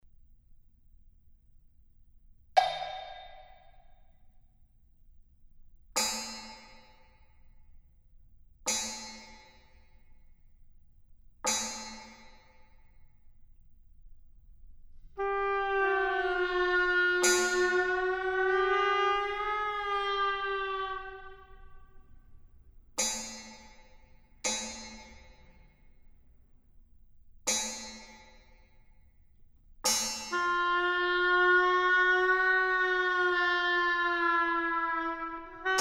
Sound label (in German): oboe und schlagzeug; john cage; ryoanji